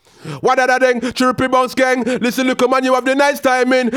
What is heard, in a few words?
C PHRASE, RAGGA IN C